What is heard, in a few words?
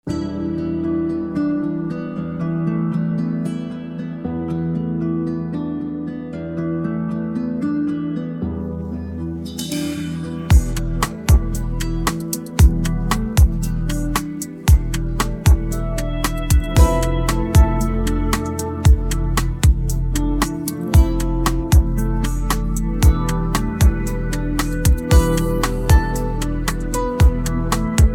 instrumental, persian